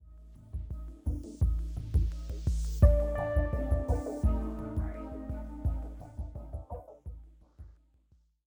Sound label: 85bpm; Legends of Pegasus; windows gamerip 2012